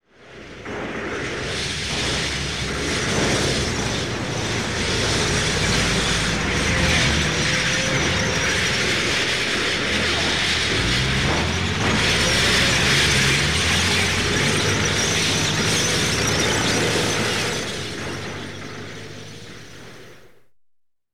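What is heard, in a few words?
zvuki tanka, tank sounds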